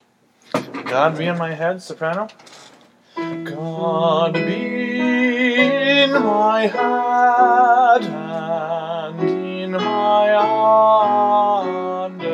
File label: god be in my head soprano